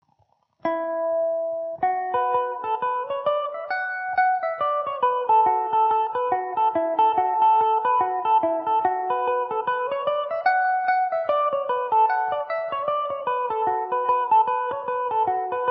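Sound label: B minor, Irish mandolin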